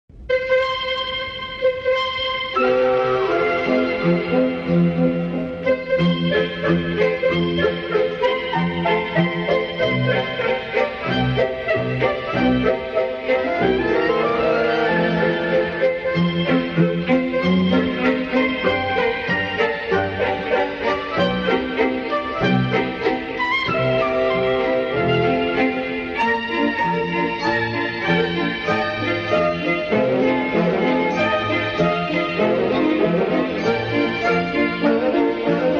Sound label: Polka; J. Strauss; Trish Trash